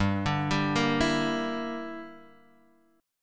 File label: G Minor 6th; Gm6 arpeggiated; JGuitar